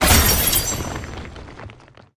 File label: Boss Dread Bloon Armour Break